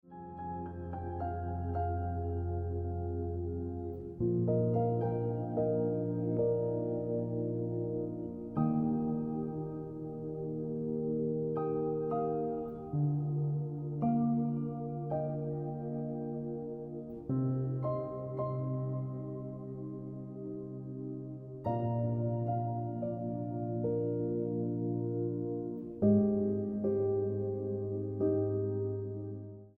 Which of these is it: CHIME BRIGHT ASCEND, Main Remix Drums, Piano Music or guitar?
Piano Music